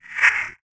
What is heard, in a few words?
alien, creature; creature SFX